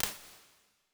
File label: Small Guitar Room, Ambience and Small Rooms; Ableton; Convolution Reverb